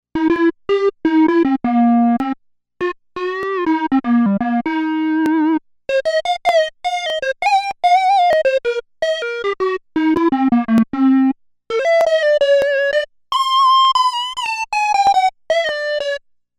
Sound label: Jazz Solo